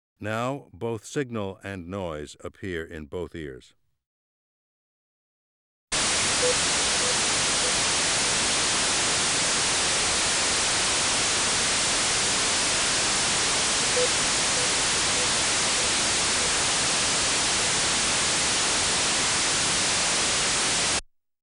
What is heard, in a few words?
Masking level differences, Auditory Demonstrations